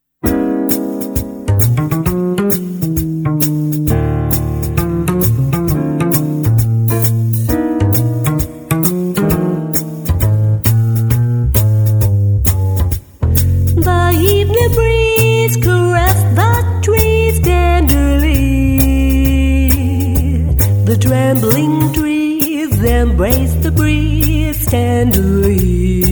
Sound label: Jazzy Swing